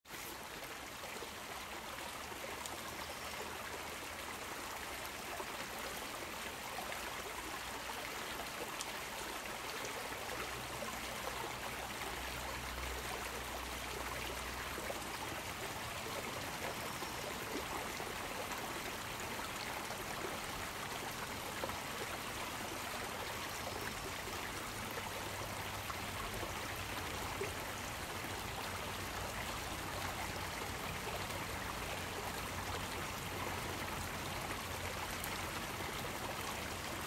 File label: Relaxing River Stream